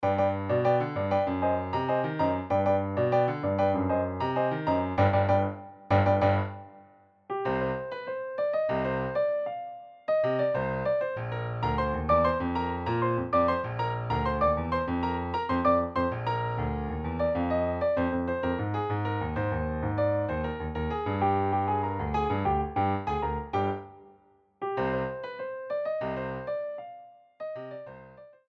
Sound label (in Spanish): partitura Piano, sample